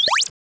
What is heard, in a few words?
powerup; opensurge